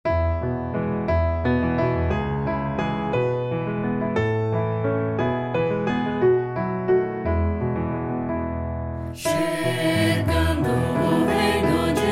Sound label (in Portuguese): voz piano